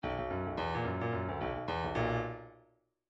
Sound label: midi grooves funk